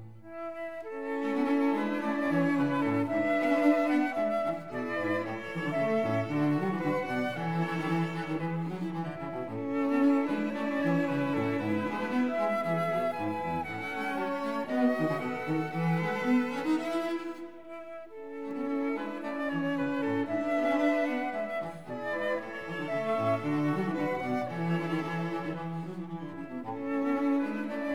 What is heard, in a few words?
flute, violin, viola & cello; A major; Andantino; Quartet; K. 298; Mozart; The Flute Quartets